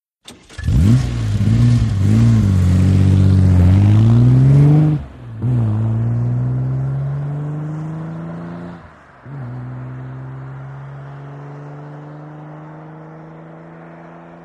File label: VEHICLES ASTON MARTIN: EXT: Start, depart, fast.